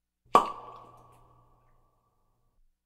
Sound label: Cork Popping